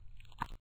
slosh; Lewd SFX

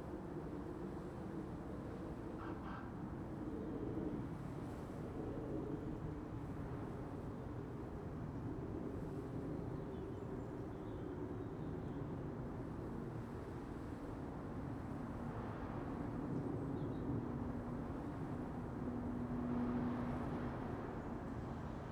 City Ambience

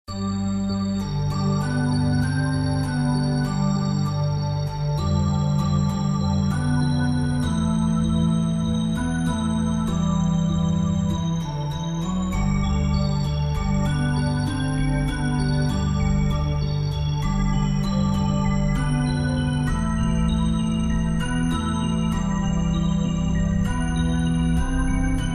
Relaxing Remix

